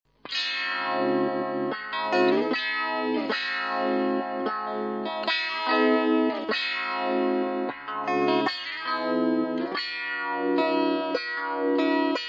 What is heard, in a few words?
meatball; psst